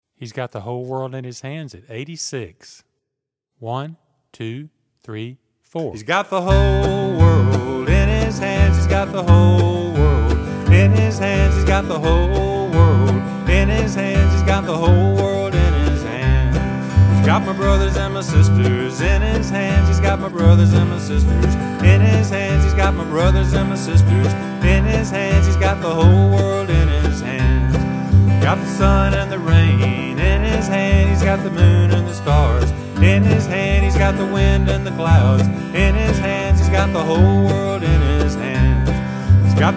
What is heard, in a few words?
86 Bpm; He's Got The Whole World